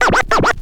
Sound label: scratch short, Vinyl scratches